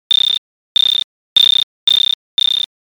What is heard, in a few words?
insects